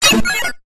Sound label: science fiction robot tone command received; zapsplat